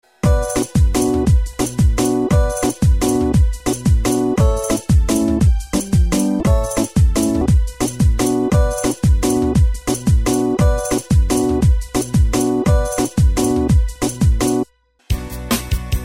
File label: pop rock rap